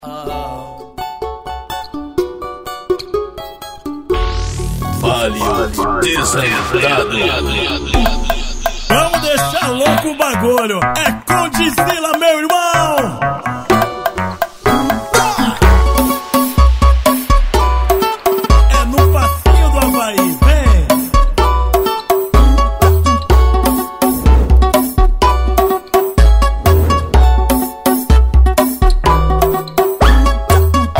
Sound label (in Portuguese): especial funk